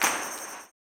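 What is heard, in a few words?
tambourine, 160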